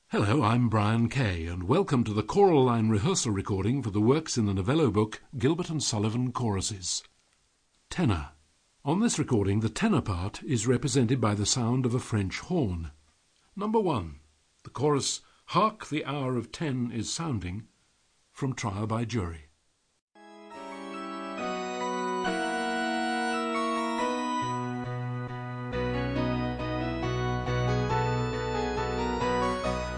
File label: Choruses Tenor; Rehearsal; G& S; ChoraLine